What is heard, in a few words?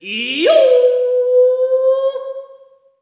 iYooh, Vocals; Roland BK-5